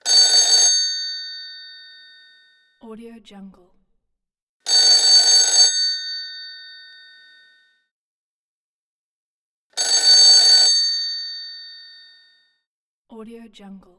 old phone ringtone

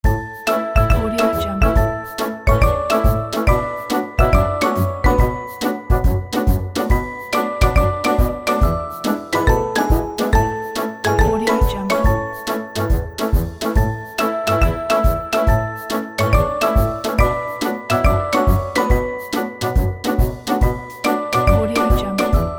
boat; animation and games characters; studioetude

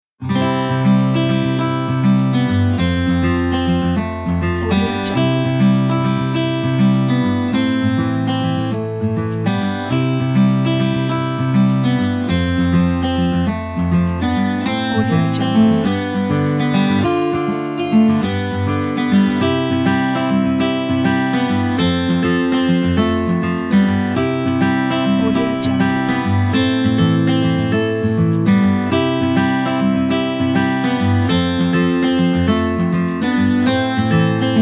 easy acoustic guitars

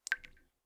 water drip into ceramic bowl pings; prosoundfx

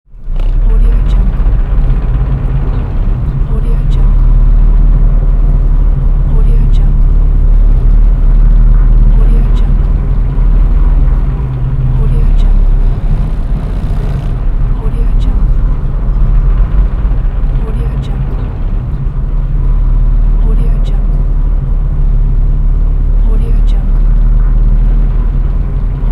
noise and rattling; teldrassil

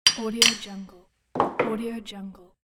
hammer hit placing hammer on table

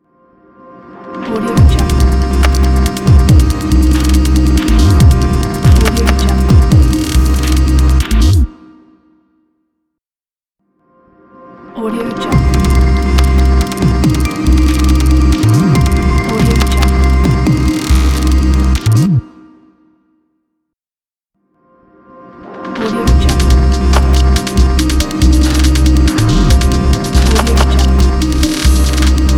abstract logo

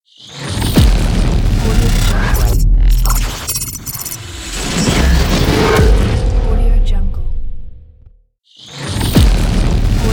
digital transforming glitch logo

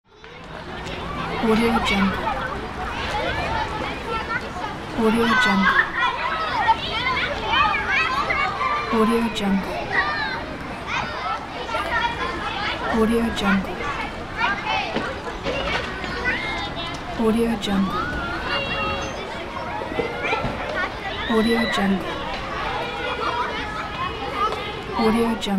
playground schoolyard ambience; greenwaysound

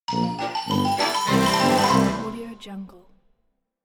cartoon animation cue

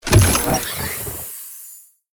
door spaceship small; soundmorph

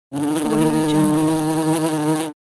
bumblebee

